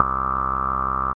filtery synth bass; SYNTH KEYS

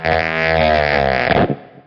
dying amp down, guitar noizes

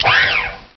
Robot Arm, cheesy lo-fi

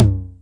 909 Hi Tom; DRUM MACHINES; 909 Extended

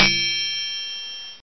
Triangle

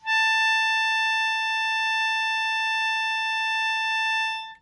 hohner soprano melodica A4

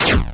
8bit kit laser, 8 Bit Videogame Kit; LO FI and 8 BIT KITS

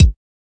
kik

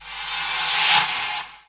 crash in and out, DRUMS (SINGLE HITS), Crashes

